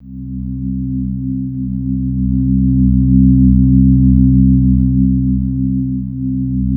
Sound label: PAD, Drum & Bass 160 BPM; Ueberschall; Drum & Bass Flash Trackz